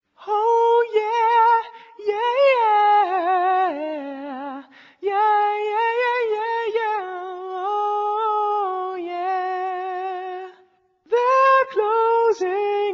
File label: vocals